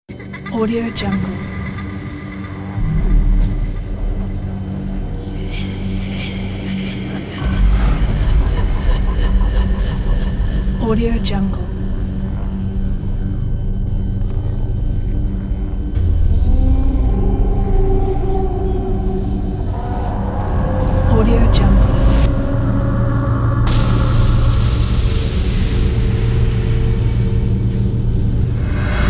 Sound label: dark scary creepy